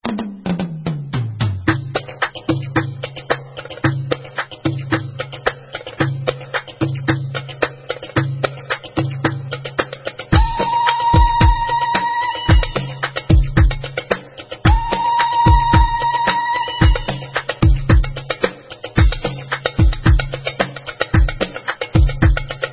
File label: Solo Darbuka